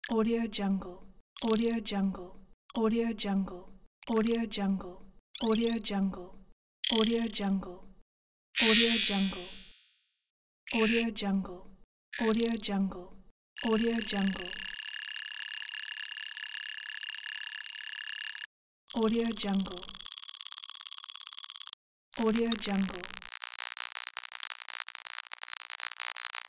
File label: little robot